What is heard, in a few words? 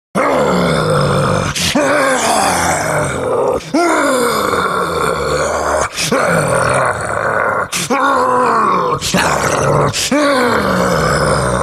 Raging Monster, Ferocious monster raging.; Monsters; Horror